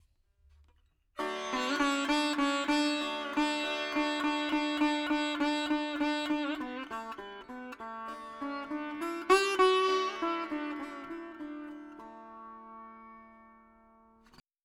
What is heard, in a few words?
4 4 D 100 Dry, Melody Veena; Sample Banks